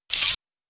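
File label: Mechanism Fast Metal, servos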